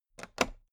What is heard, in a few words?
toolbox latch open regular